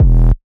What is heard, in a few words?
Bass; Dystopia